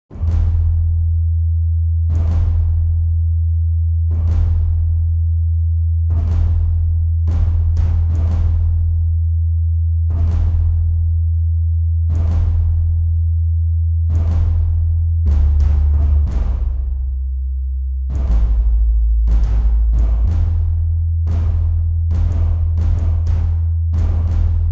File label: Percussion; DecodingHistory; ProScores